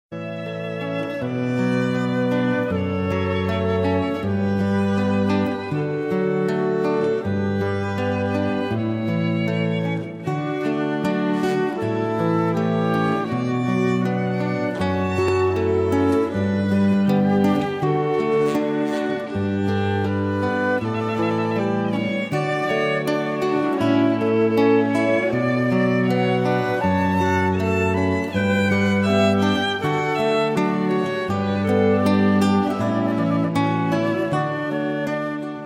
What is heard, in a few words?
Canon